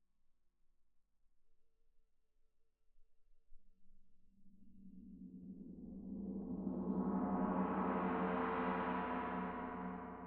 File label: Tam-Tam Main